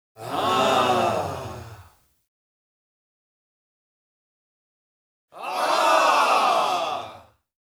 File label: Crowd; Sound Ideas 6013